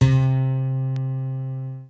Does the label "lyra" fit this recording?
Yes